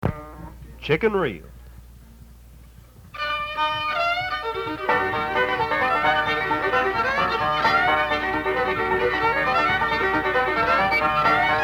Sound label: Chicken Reel